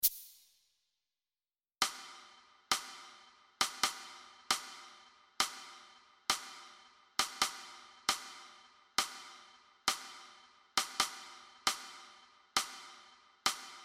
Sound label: Drums